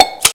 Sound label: Shutter; KU950; LG; System sounds